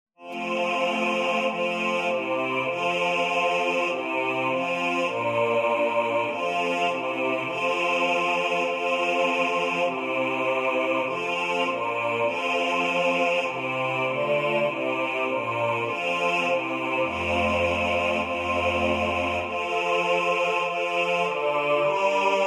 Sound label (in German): Bass; Alta trinita beata